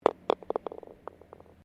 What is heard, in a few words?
GOLF BALL IMPACT CUP; Golf Sound Effects; Various Golf sounds; Golf (Sound Effects:Sports)